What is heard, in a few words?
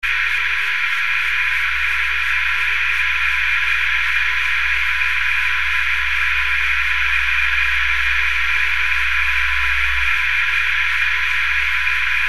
noisy flow midfrequent